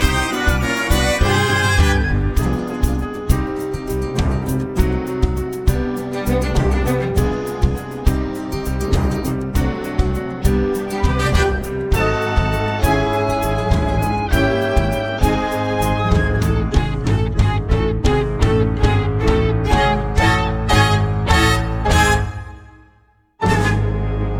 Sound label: instrumental